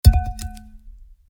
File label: bat Drop; butterflysoup2